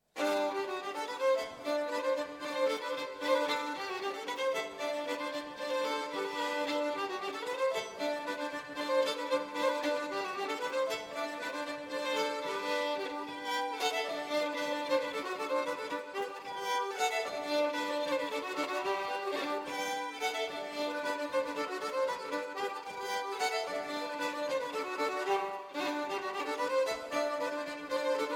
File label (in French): bourree